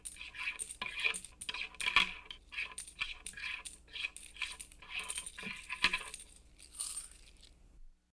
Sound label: coins count